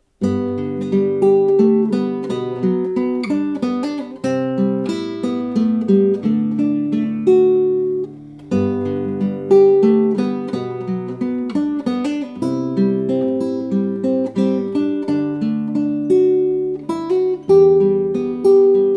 Courte mélodie

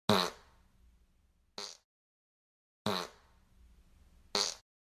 bruit de pet

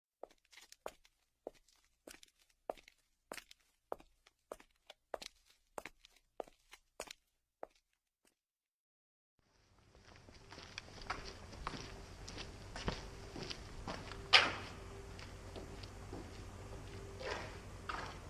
bruit de pas

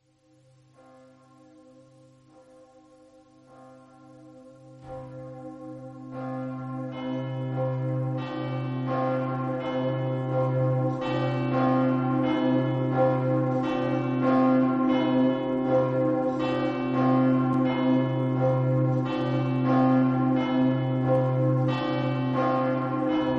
Les Funérailles, Cloches Sonnerie du glas; Traditionnel

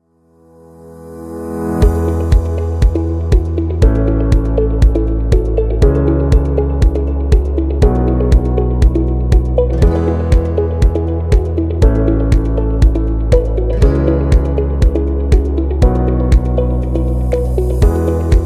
Musique Joyeuse Corporate; Business

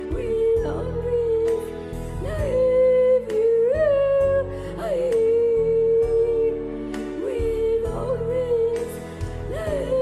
chanter faux

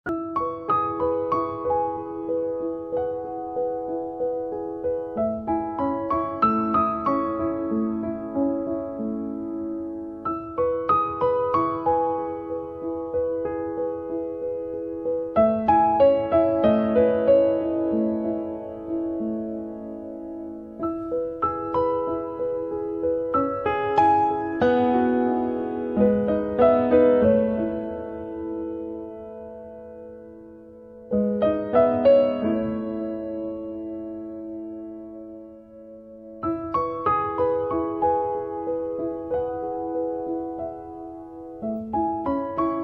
music douce super relaxante

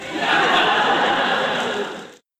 Rires

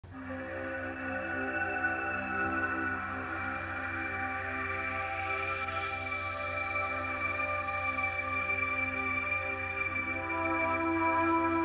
générique; thalassa

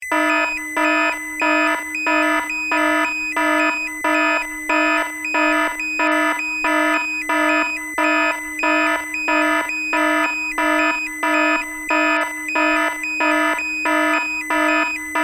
alarme labo

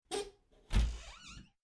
chute ecran

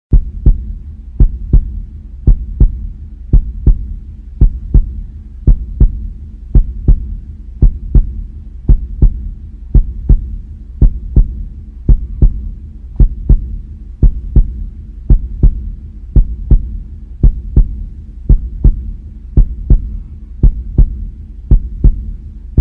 battements de coeur bruitage rythme cardiaque

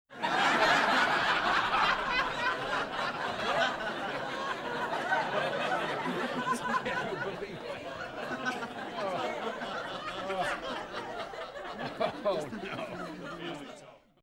Rires

